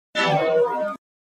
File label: alarm, alarms; GregTech; OpenSecurity; SGA